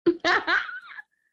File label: jazzy laugh